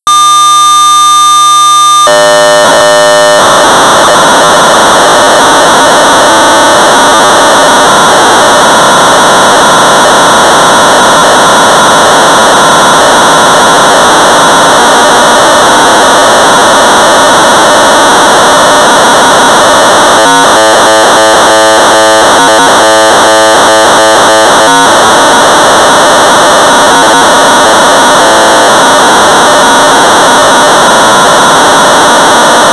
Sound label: Sorcerer 1200 baud; Space; BASIC